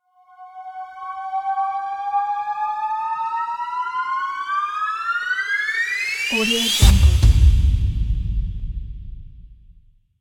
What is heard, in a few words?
transition